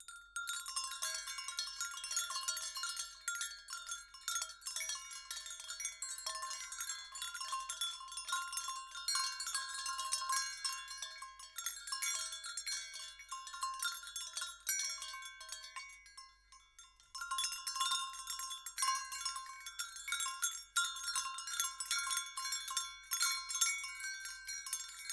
Bells On String

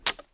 Breaking A Wooden Stick

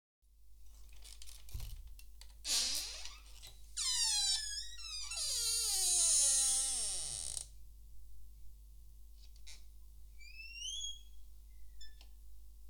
Creaky Door

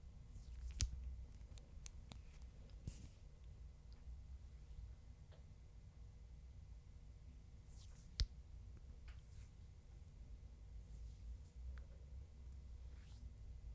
Pulling Fluid Into Syringe

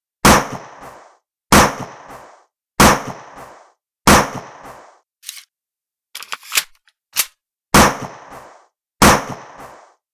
Gunshots

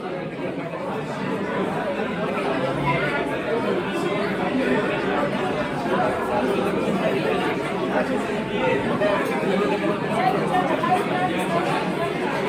Crowd At Cafeteria

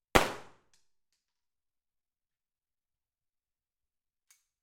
Glock 17 Semi Automatic Hand Gun Pistol

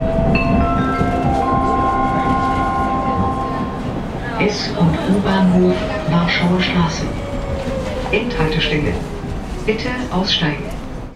Berlin Public Transport

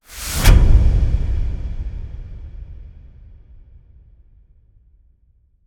Big Impact With Metal Top And Swoosh

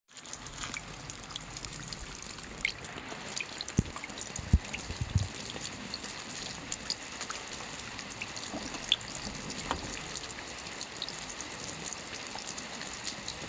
Rain Sound Water Drops

